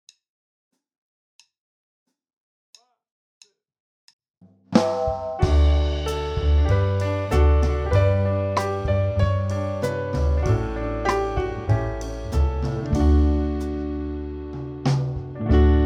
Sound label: karaoke